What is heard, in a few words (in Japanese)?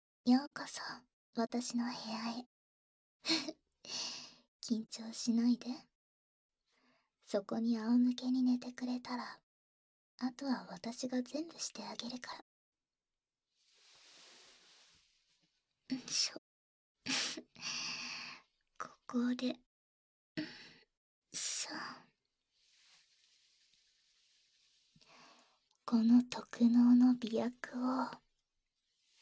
耳舐め(バイノーラルマイク 効果音なし); 夢魔の世界～夢魔の触手耳責め地獄～; 耳舐め媚薬漬け連続射精地獄